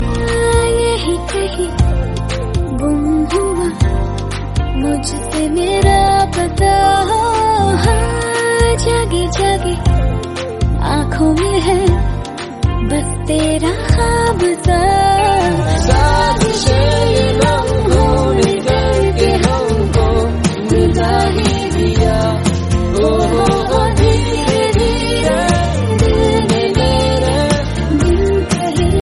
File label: female